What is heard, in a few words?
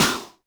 snaredrum, Yamaha RM 50